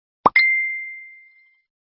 best viral notification ringtone

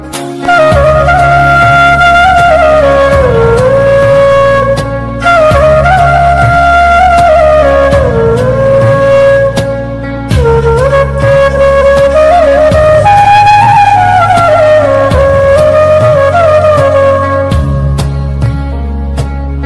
instrumental ringtone, bollywood ringtones

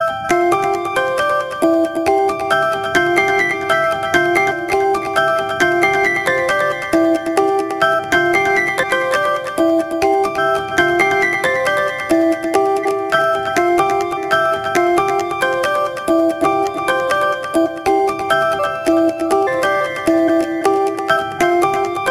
lg v40 ringtone

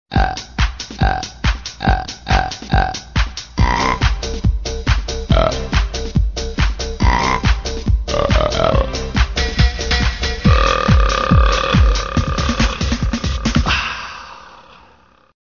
tamil funny sweet ringtone

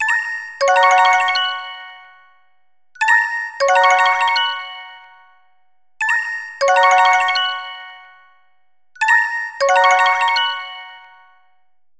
call notification tone

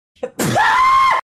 spoon sneeze ringtone

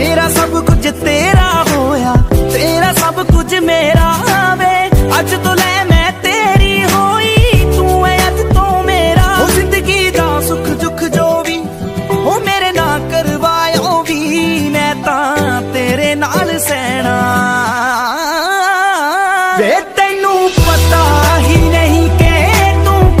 love whatsapp ringtone, bollywood ringtones

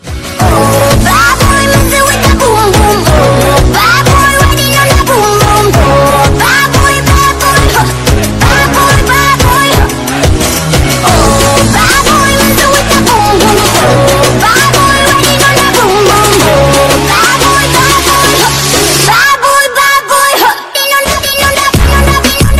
english ringtone

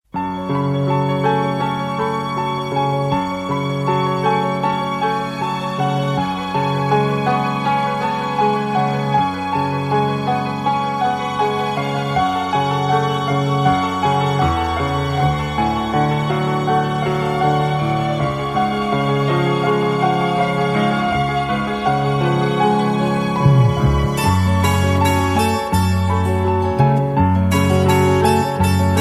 romantic classical ringtone

